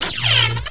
travel lightning; emp; Earth and Beyond; EA Games

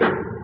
player ladder; Group Mapping Project

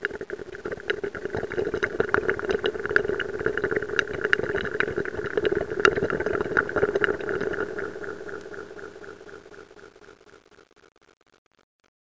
DRAIN

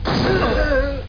exp squh

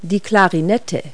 clarinet; Multi-Lingual Talking Picture Dictionary

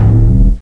pickup

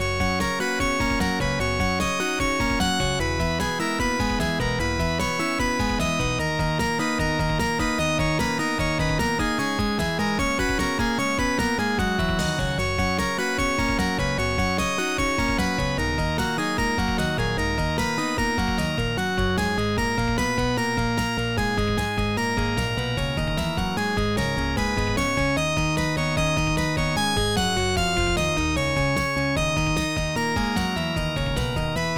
theme

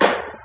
player ladder

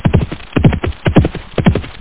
GALLOP SN

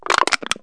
TWIST CAN; HOUSE; CANS

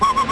aliens

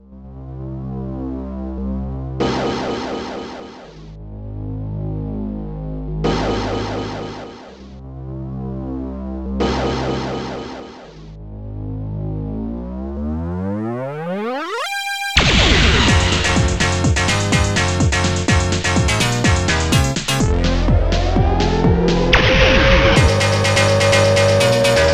TECHNO

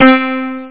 accgit; da capo vol1; guitar

